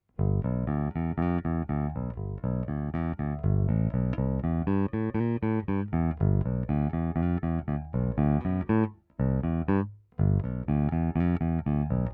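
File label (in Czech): S-x prsty, poniklovaná ocel, Roundwoundy, Schecter SLS Elite-5 baskytara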